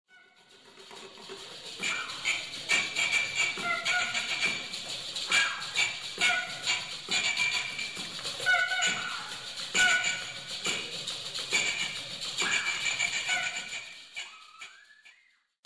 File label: Carnival Rhythm; metasound project